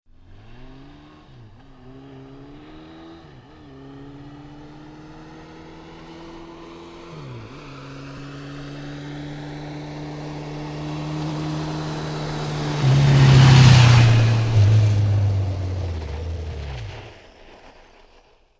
Motorbike Honda 1100 Pass, Slower pass then slows down; Motorcycles